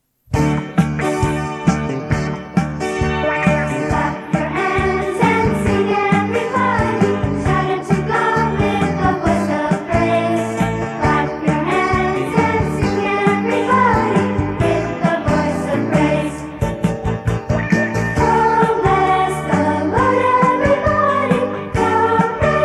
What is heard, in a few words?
Medley